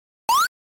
jump; grade1; english; identifying letters third

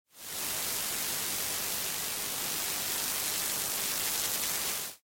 radio loud noise; environment pollution

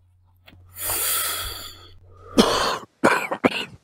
Smoking Coughing Sound; grade3; smoking drinking

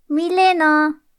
incorrect

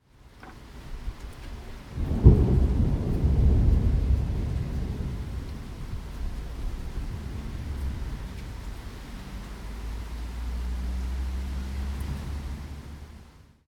thunder; grade3; weather

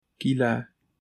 screw; properties of objects new

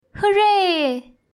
hurray

